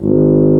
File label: Tuba mf G#0; Multisamples; Digital Sound Factory Virtuoso